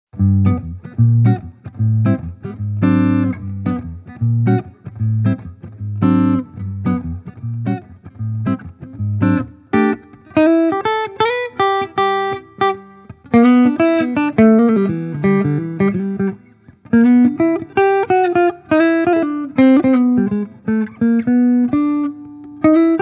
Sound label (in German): Ibanez AG75G Hals Tonpoti